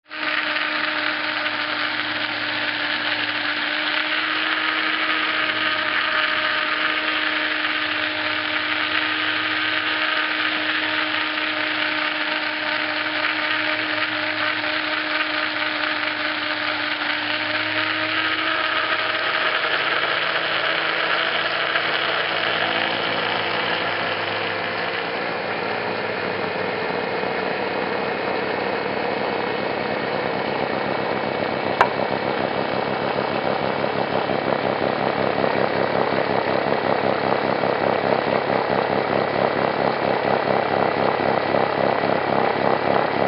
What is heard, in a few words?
run dry